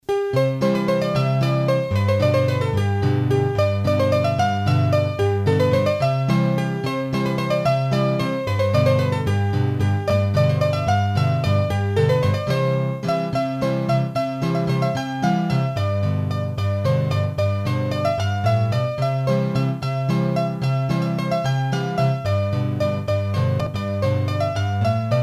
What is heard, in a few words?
Bourree, hurdy-gurdy, Auvergne